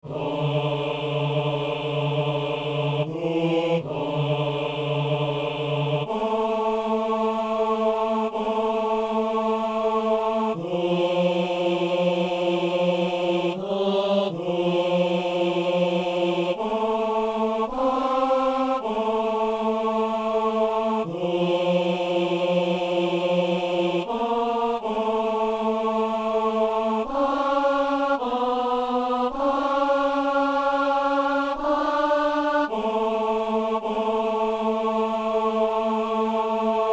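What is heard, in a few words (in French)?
ténor; messe inaugurale; doxologie du canon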